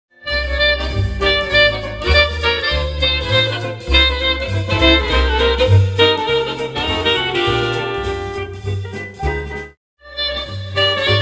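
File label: saxophone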